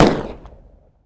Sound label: gun; Phoenix